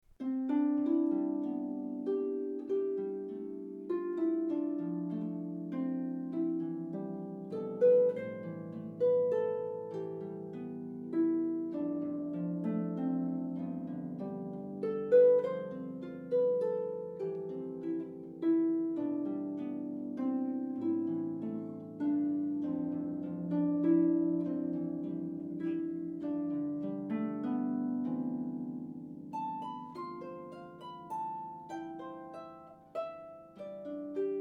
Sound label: harp